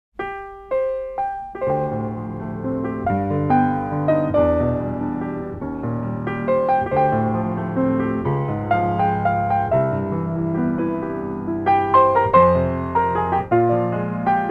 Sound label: love piano